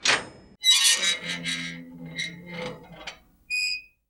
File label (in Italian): cancelletto apertura; Inquisitor 3